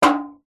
impacts metal small tin like hollow hit tap

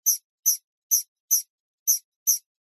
birds baby chick call chirp; animals

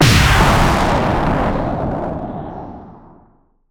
Impact Boom Distorted; bottlerocket

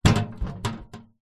metal box large set down soft surface

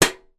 small metal case tin lid shut; foley; zapsplat

